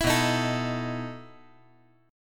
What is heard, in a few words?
B 11th